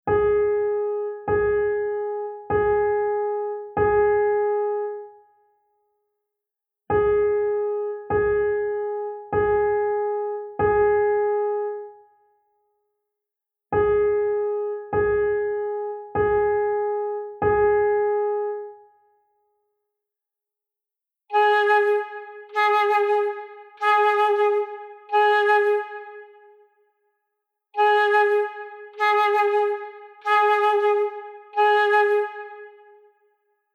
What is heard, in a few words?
Patterns of four notes the same